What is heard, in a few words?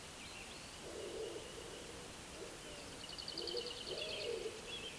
Sylvia curruca